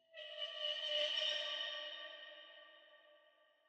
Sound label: ambience arena creak